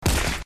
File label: bullet hit